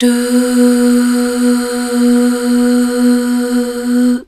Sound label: DUUH FM R, Hallelujah, BACKGROUND